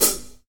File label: foot cymbal A key; 1970s; hi-hat